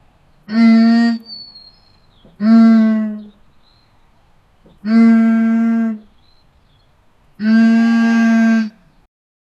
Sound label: cow